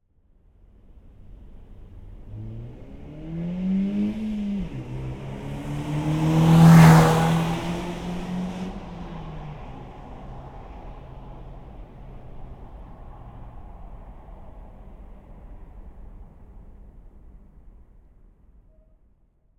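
Nissan Qashqai I 1.6 exterior, 80kmph passby acceleration whoosh; stereo AB; Soundholder; Cars In Motion II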